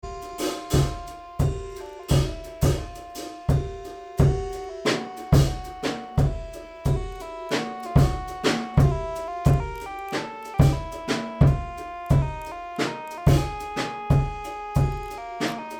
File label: tuner drums; live drum-guitar collision; clean and distorted